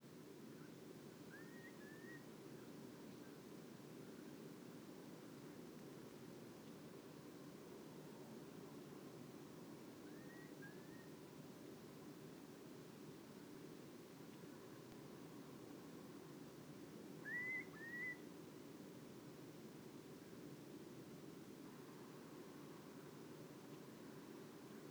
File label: Curlew; Stoborough